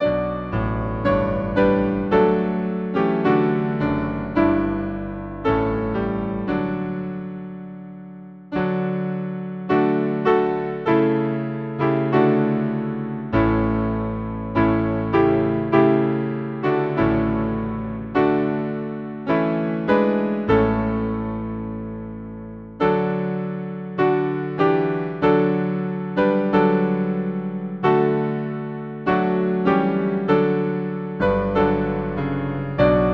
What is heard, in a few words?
Hymn, piano; My faith looks up to thee; Olivet